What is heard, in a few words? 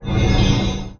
absorb mana; RPG Maker; Legend of Heroen v1.20